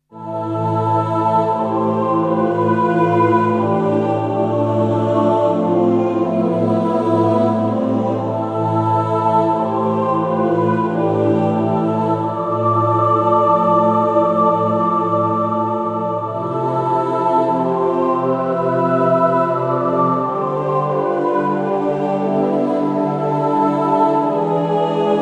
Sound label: Instrumental Version; Prism Ark